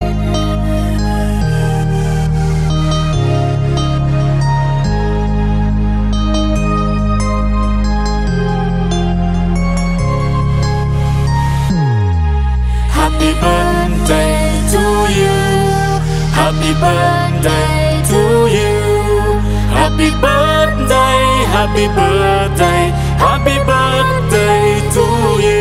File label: Happy Birthday, music